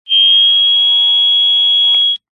cb radio feedback burst high pitched speaker pov

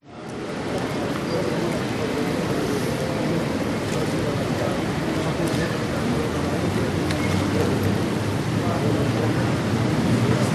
Qatar Airport Hallway Terminal

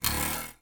cartoon bounce diving board; zapsplat

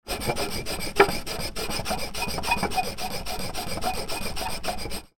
foley wood pole turn rub scrape multiple times; zapsplat

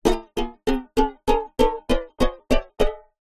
cartoon ascend steps strings

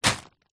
food frozen peas bag down on surface; zapsplat

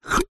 cartoon suck pull squeeze

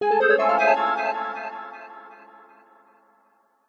fantasy magic mystery glissando flutter strange; zapsplat

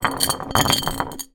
household glass jar large roll concrete ground; zapsplat

